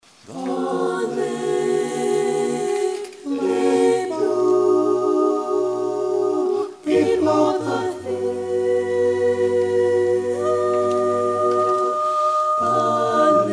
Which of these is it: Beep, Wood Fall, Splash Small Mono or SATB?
SATB